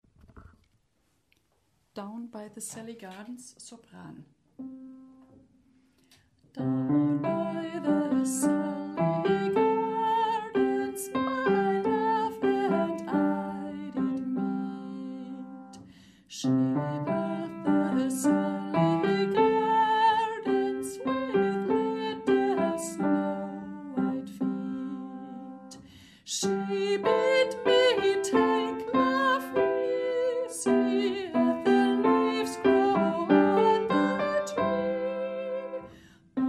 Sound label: Down by the Salley gardens Sopran; Chor; chor ag